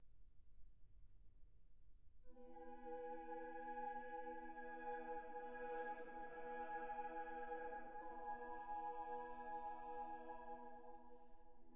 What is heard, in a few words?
Chorus mysticus; Eesti muusika; Tobias, R.; Des Jona Sendung II osa